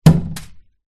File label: leisure juggling bean bag small hit single pane window; zapsplat